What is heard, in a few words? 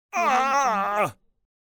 male grunt